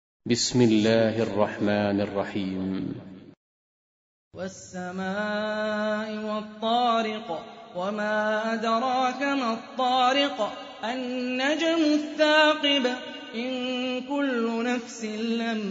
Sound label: Quran; Hani Refaey